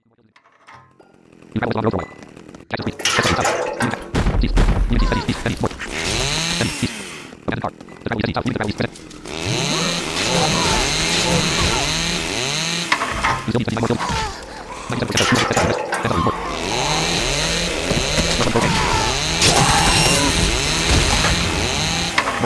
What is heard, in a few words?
chainsaw; weapons quest; Stormy; Swamp